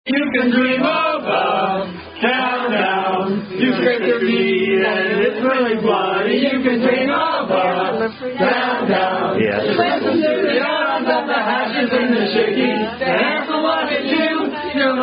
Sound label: Hash Song; Unknown Hash Song